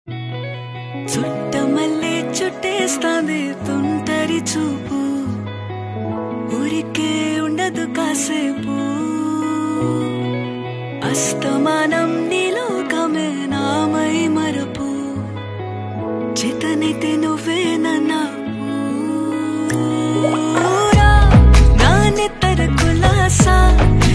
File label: telugu ringtone